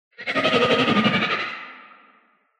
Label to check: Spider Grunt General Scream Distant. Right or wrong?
Right